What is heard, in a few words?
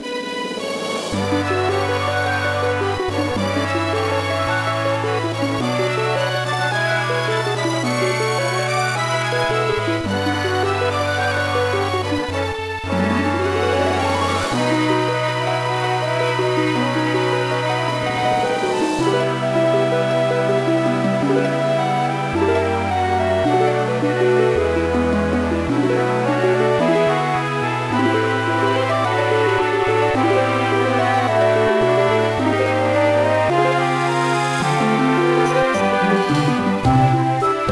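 background music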